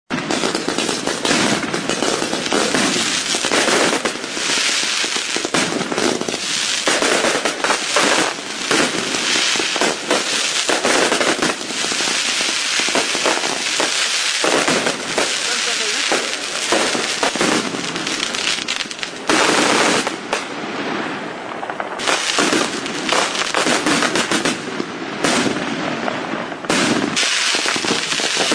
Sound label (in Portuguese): Fogos de Artifício Efeitos Diversos; Sony